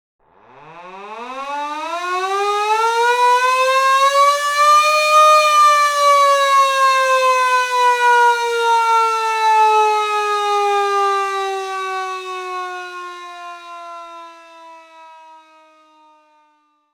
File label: Air Raid Siren; wrath of the mechanical monsters